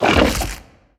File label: scare; sistersvis